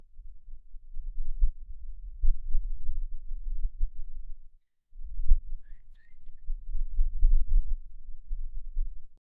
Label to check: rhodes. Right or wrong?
Wrong